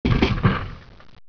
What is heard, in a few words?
door stop